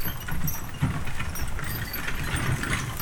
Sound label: Horse Drawn Wagon; Electa Quinney